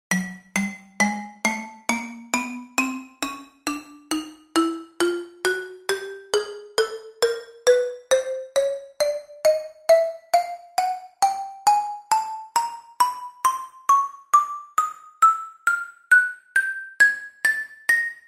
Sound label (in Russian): shagi iz mult